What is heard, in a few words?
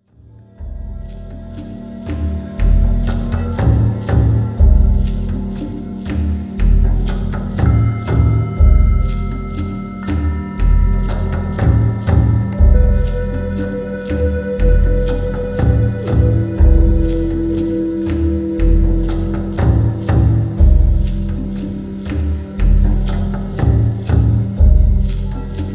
Shaman Drum